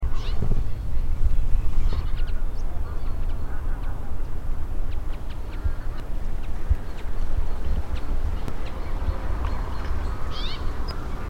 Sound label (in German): bergfink zugrufe